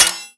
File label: bullet light ballistic blades deflection; Titanfall 2